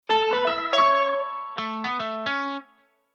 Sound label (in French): LOGO SONORE; Art et Pare Brise